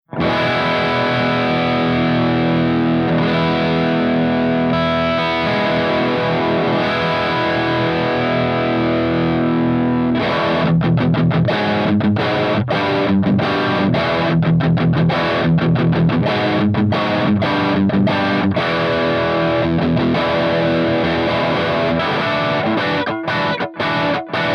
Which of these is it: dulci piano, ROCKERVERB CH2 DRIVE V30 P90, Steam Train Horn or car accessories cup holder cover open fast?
ROCKERVERB CH2 DRIVE V30 P90